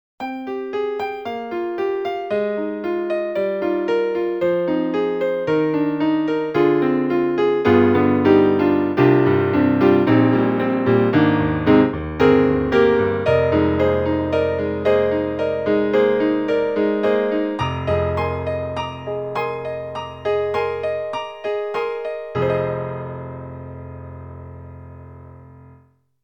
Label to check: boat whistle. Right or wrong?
Wrong